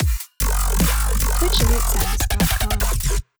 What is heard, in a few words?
Dubstep Donation